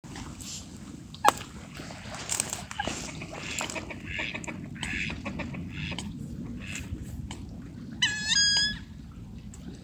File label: Wood Duck Aix sponsa